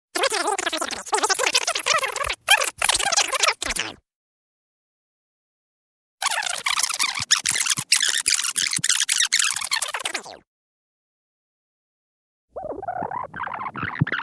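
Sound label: rewinding of voice on tape recorder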